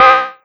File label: SYN, Drum & Bass, 160 BPM; Ueberschall; Drum & Bass Flash Trackz